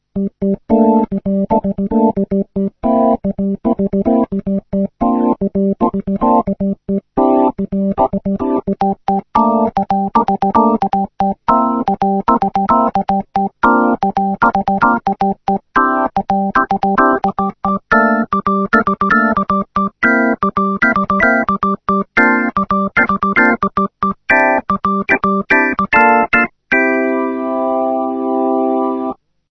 percussion

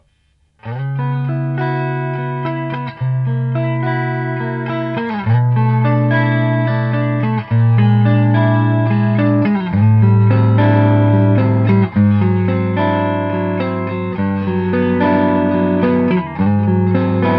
Jazz

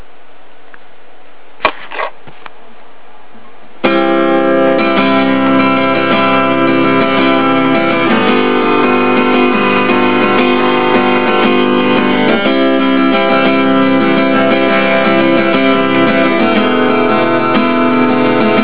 guitar

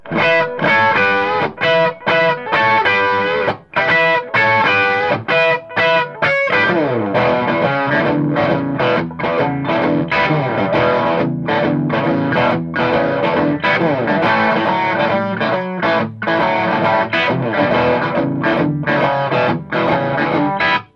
Boost

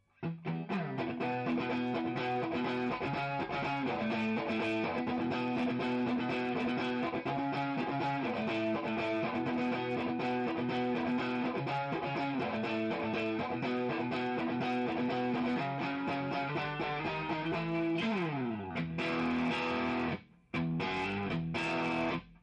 Distortion

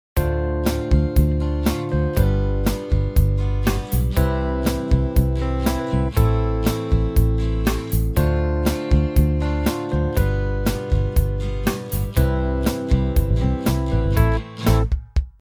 karaoke

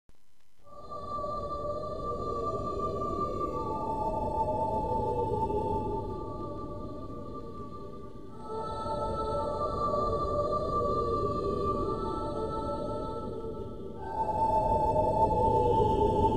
storm, meditation; 嵐

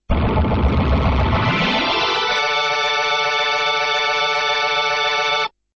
full gliss

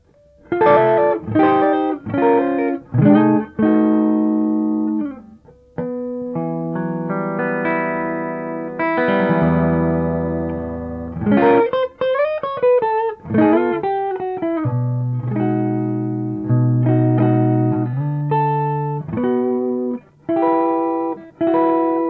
Rock

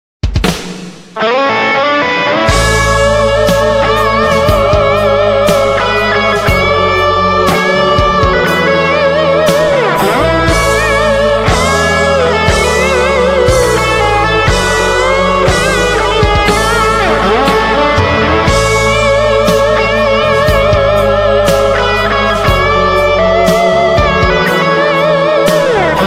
POWER

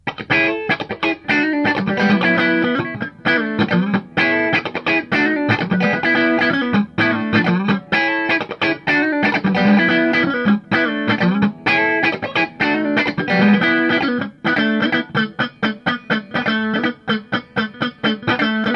Jazz

